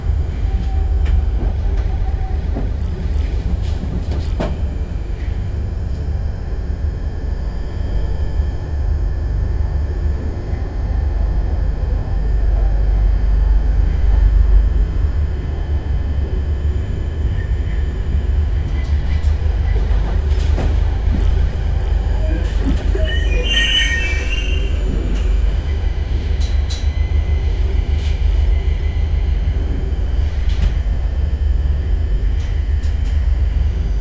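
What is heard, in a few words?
indian train interior with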